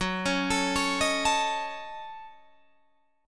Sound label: Gb Minor 6th Gb Guitar Drop D